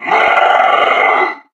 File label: yeti statue growl; Source Engine; tf; lair